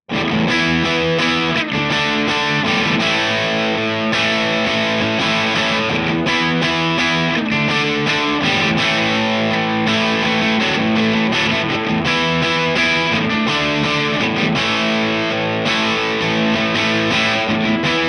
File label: crunch; jensentone